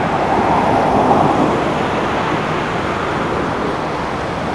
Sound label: traff wnd, wind